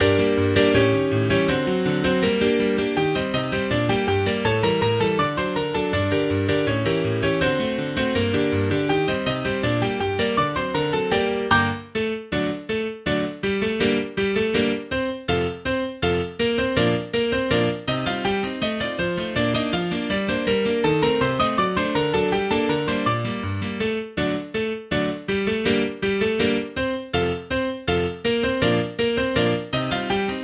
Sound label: Spanish Dance